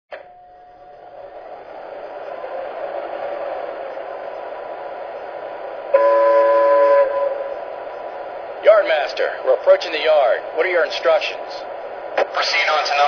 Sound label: ep5, trains